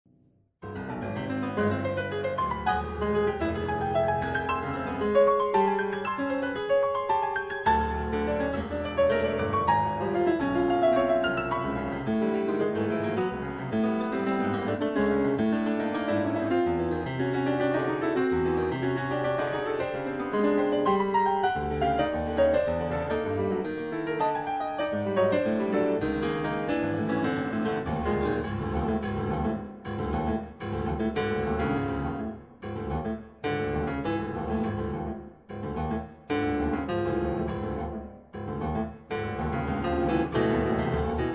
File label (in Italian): Fantasia per pianoforte; Tocata-corale-Coda